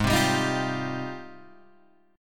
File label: Ab Major 13th, Guitar, Open G